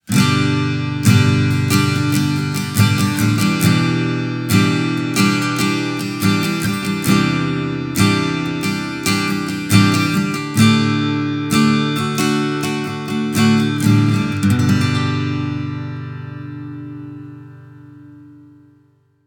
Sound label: STRUM